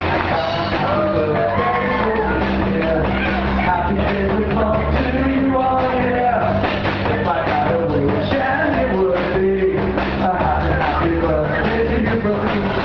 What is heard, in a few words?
birthday song